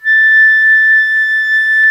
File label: FLU, Flute; Sound & Vision - Gigapack I CD 2 (Roland); Brass&Woods2